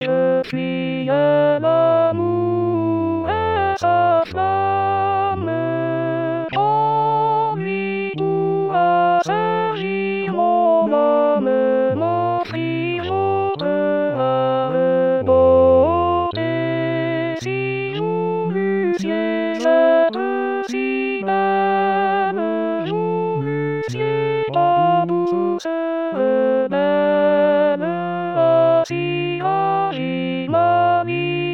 alto; rimailleurs

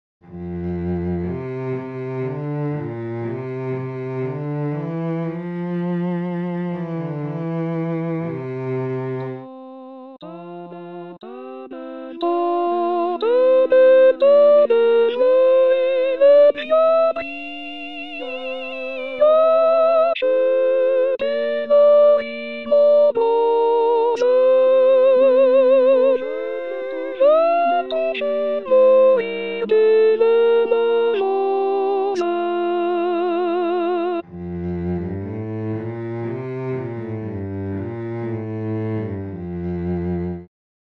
tanta beltad sopran

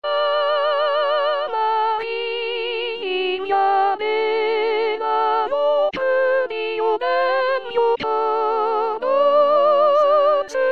amarilli soprano

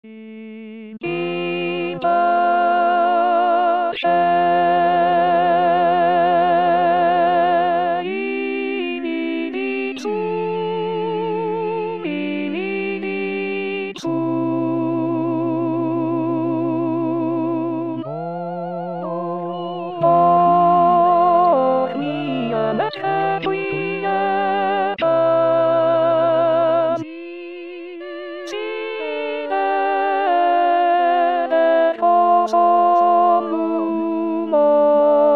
alto; in pace; 2023 st jean

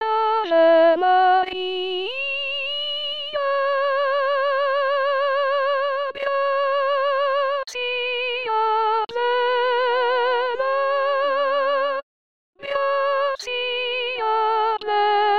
avemaria soprano, vox; victoria; 2016 vox maria

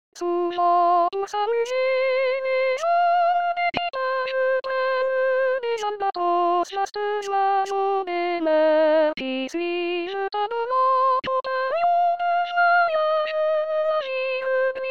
sopran; lalbatros; rimailleurs

